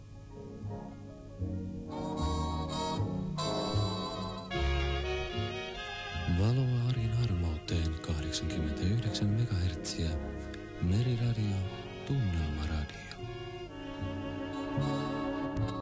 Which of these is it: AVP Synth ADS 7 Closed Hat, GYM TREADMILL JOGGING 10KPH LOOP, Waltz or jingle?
jingle